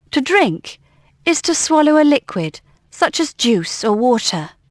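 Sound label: drink; Dorling Kindersley My First Incredible Amazing Dictionary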